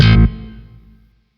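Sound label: Sentient Shawzin Slap Octave One A; Chords; Warframe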